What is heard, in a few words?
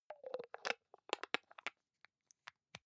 hygiene small screw cup close soft, badezimmer